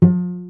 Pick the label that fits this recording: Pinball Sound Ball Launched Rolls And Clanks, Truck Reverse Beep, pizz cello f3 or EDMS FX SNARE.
pizz cello f3